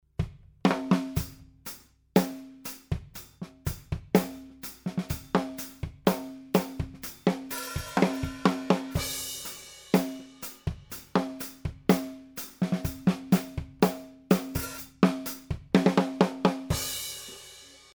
Snare and mono overhead phaze aligned same polarity